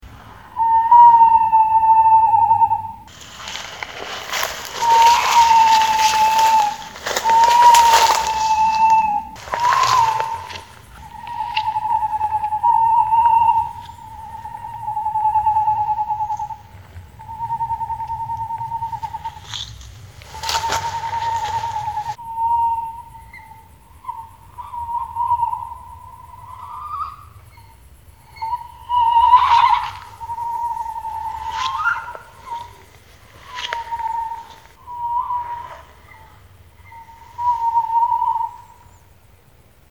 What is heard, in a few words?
strix aluco